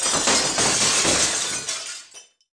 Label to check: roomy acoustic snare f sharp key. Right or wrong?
Wrong